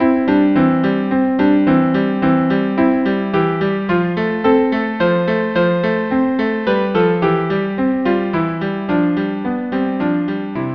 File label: viola duo